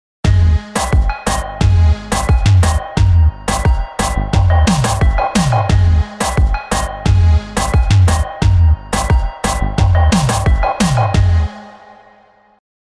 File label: Zoom ARQ New Reggaeton